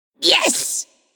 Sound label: nv yay; smite; voicepack; morgan le fay